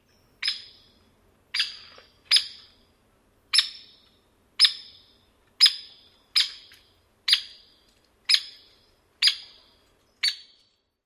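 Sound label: Hairy Woodpecker, tweeks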